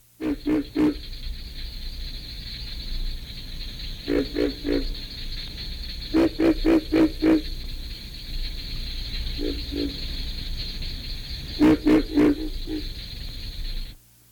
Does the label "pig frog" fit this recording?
Yes